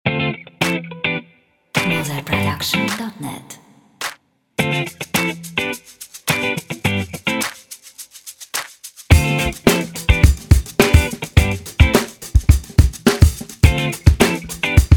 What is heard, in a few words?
Funk Beats